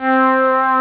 Rich String

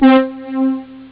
Hall Brass

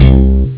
Slap Bass

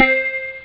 Ping Bells

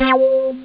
Korg Bow

